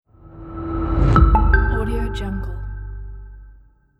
modern short technology logo

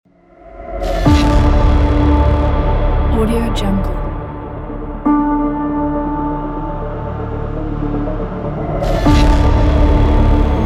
epicness power intro

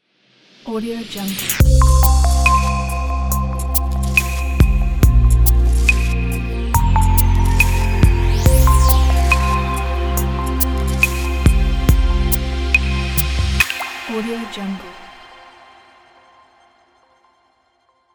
hitech innovation logo

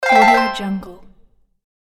prize bright game sound

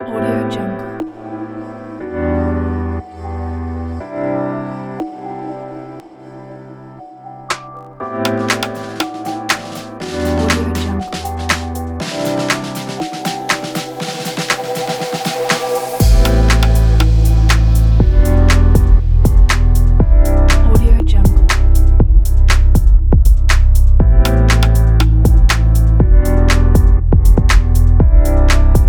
short electronic chill

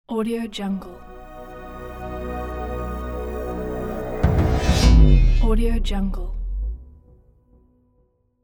glitch sting reveal opener logo

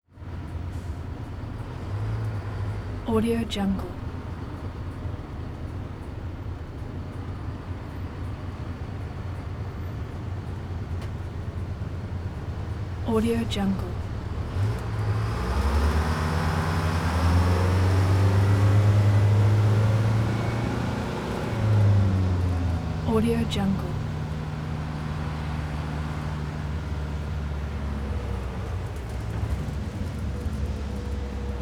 greyhound bus driving constant med speed

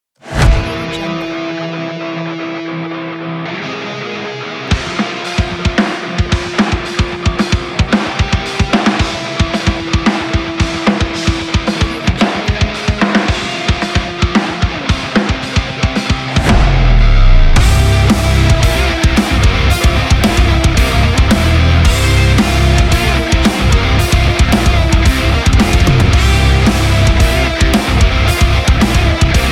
extreme sports metal

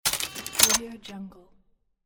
cash register modern

